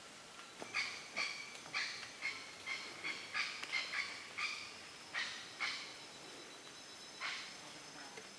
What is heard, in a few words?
Red necked aracari